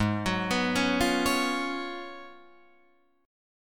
Ab Minor 13th, Ab, Guitar, Drop D

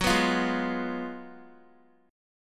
Gb Major 7th Suspended 2nd Suspended 4th, Guitar

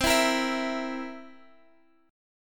B sharp 7th, B sharp; B#7; strummed

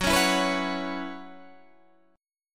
B Suspended 2nd Suspended 4th, F sharp, chord

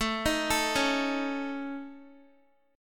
A Major Flat 5th, Guitar; AMb5 arpeggiated

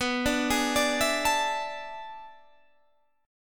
A Major 7th Suspended 2nd Suspended 4th

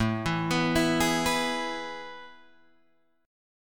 A Major 7th Suspended 2nd, Guitar, Drop D

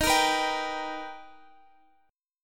B Augmented Major 7th D sharp, chord

